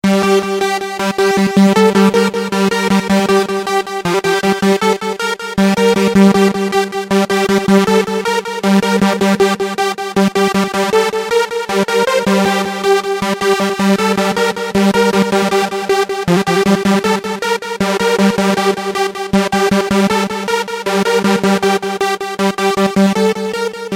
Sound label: Unison; EMX